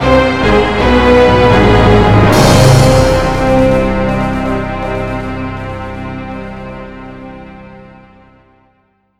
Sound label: test midi